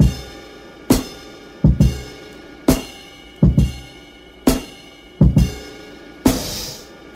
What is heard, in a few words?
riding rides, drum loops boombap